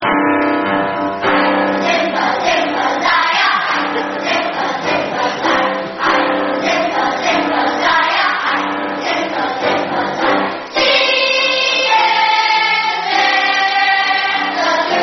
I Come A Zimba Zaya, Zulu Warrior Song By Children And Sung In Round